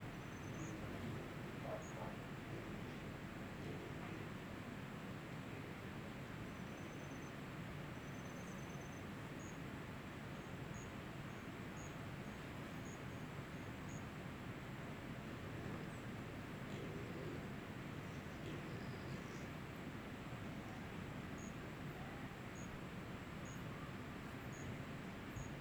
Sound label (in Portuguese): Rio longe com porco e grilo